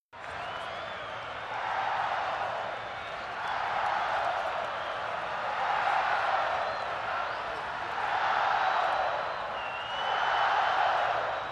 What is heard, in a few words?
Football Crowd Chan, sports arena football